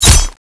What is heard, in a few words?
wind knife hit